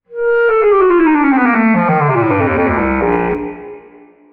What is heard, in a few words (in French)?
clarinette basse